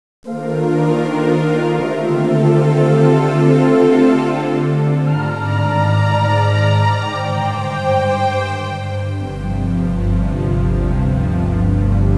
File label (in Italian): audio suonerie musica mistica